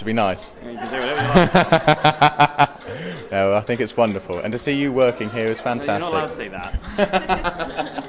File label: metronome room